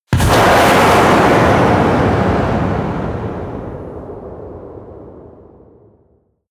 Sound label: missile large fire airplane close